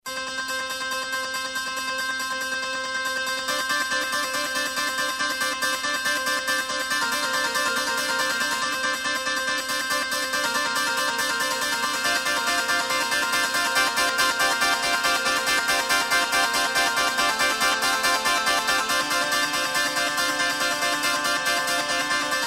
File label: Dust Synth Rendition